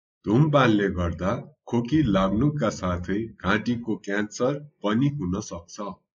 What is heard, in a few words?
throat problem; grade3; dhumrapan nagarau